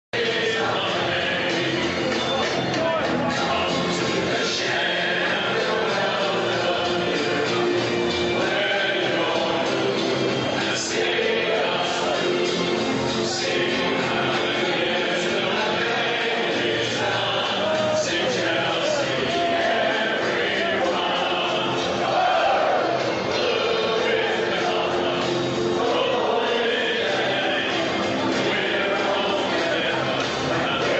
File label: Crowd Chorus; Chelsea F.c.; Chelsea community